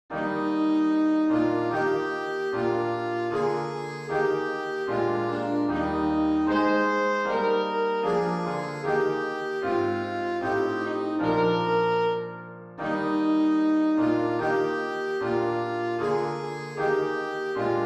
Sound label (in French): hymne national allemand; haydn joseph